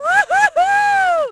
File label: yell; Splashdown; XBOX